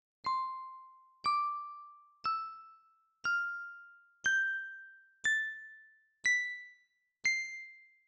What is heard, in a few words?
bells; SFX Daruma